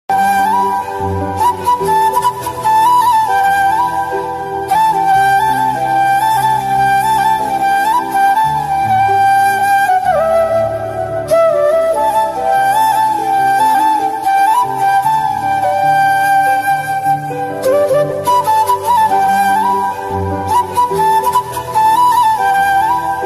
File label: romantic ringtones hindi music ringtone